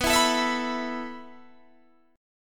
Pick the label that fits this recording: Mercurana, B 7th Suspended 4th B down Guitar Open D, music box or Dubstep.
B 7th Suspended 4th B down Guitar Open D